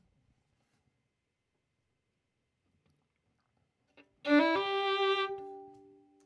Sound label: Violin